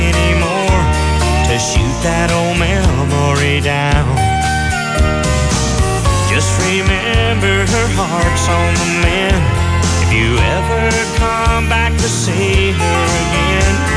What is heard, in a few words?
country